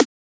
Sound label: Transient; FLPRO